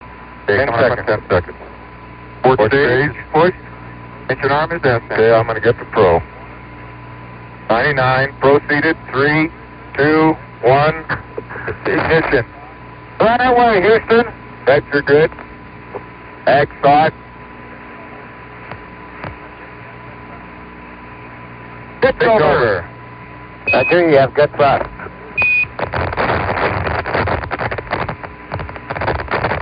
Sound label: Ap17 ascent, Programme spatial Apollo